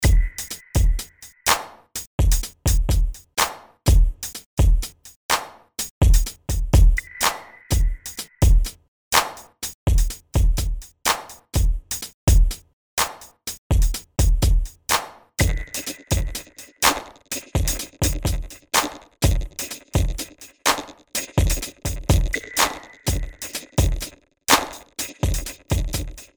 Vocoder on Drums; XILS Vocoder 5000

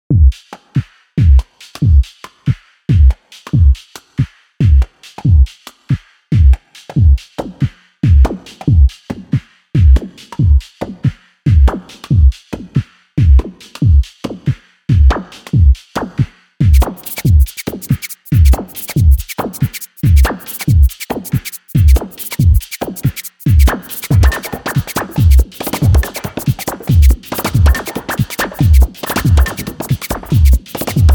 Deep jungle sweep; StiX

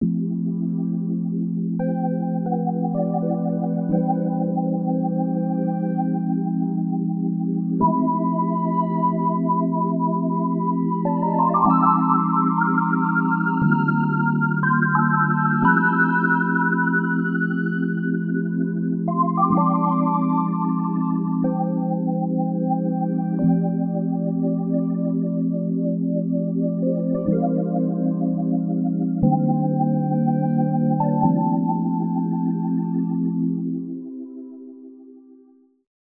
Rotary Organ

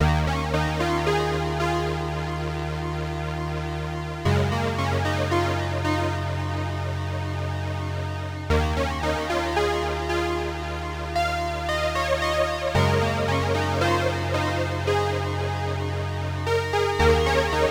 Vibey Keys

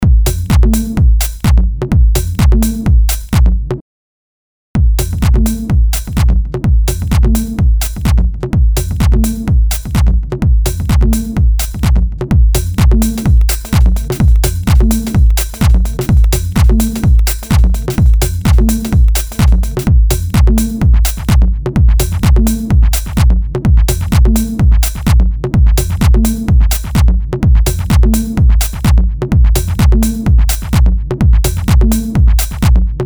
Elektro Drums; LeMasque

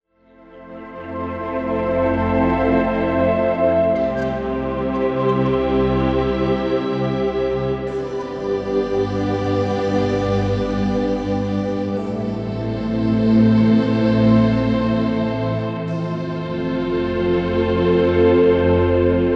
trance pad 120 wet; Oxium

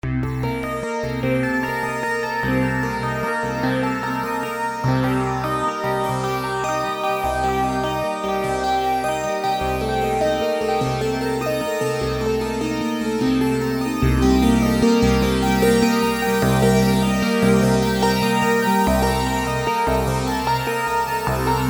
sq indian 120; Oxium

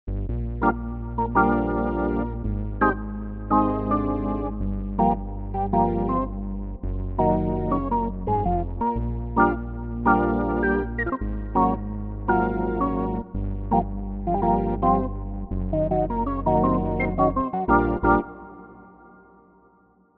E Organ A; XILS

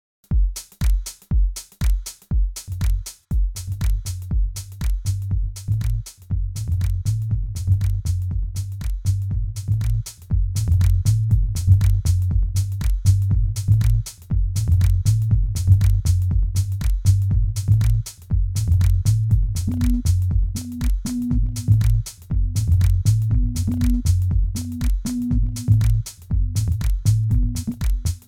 Live Drum Loop; LeMasqueDelay

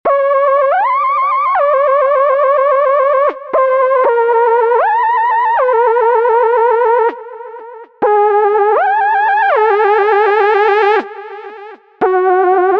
Haunted Voices; XILS

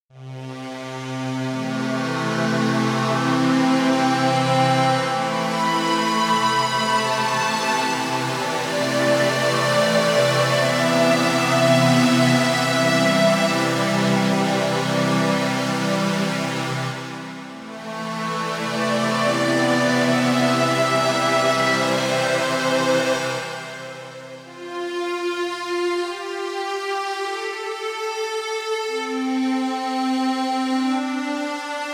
detuned strings; Carpenter

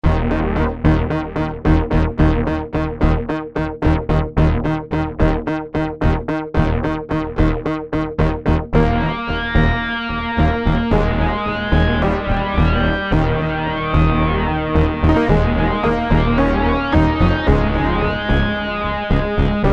Dirty Sync Lfo; XILS

